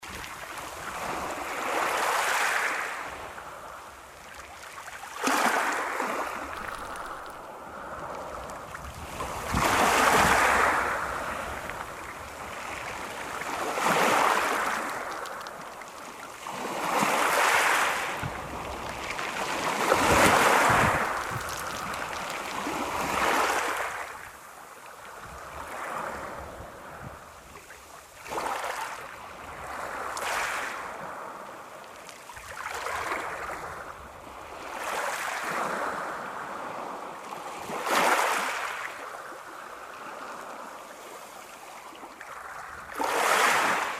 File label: stones roll over in waves